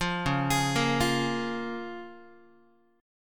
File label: C sharp 6th C sharp down Guitar; arpeggiated; C#6; JGuitar